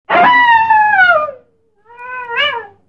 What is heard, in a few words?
coyote